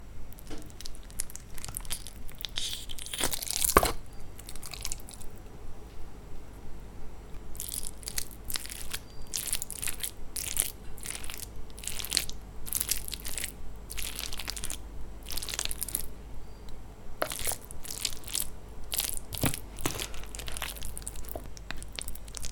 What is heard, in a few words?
slime sounds